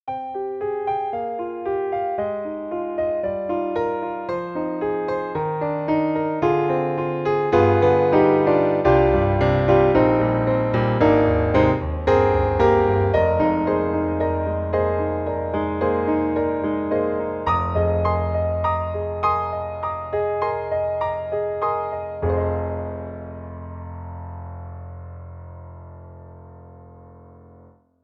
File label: Mini Grand; AIR Music Technology; Lights Off In The Studio